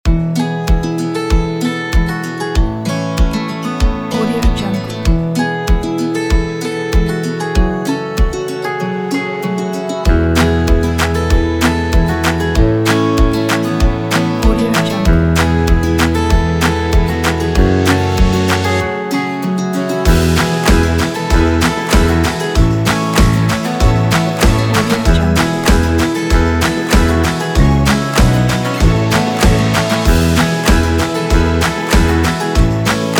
timelapse

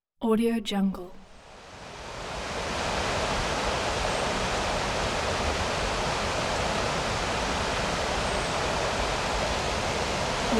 heavy river flow

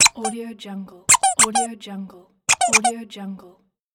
toy squeaks

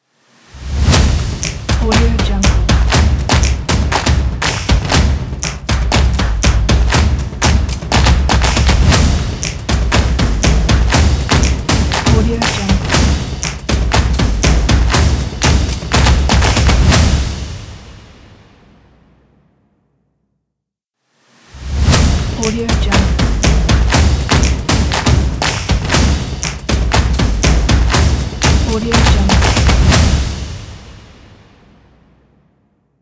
claps drums sport logo

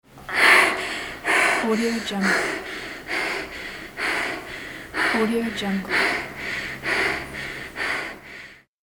breath; 3dhome

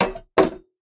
cooking game set down medium pot sink

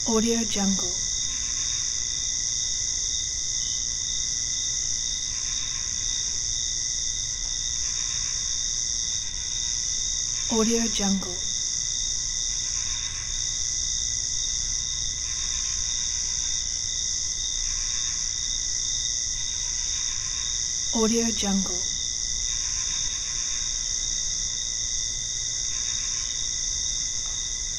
night crickets; bogdansfx

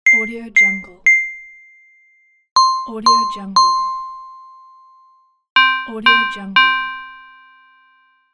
incoming message

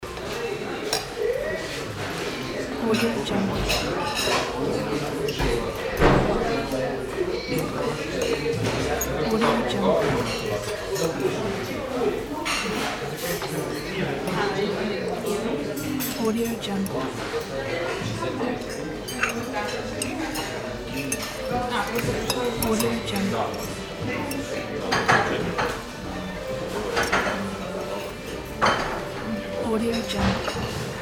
cafe ambience

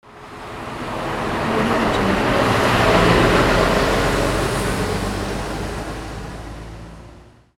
truck turning left

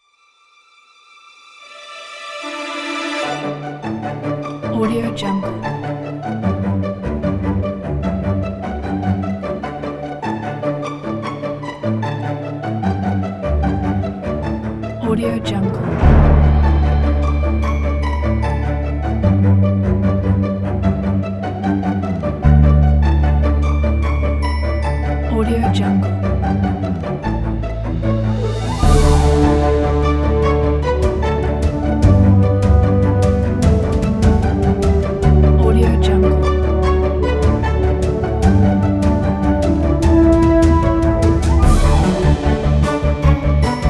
cartoon starting or captions

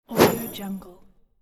coins whoosh

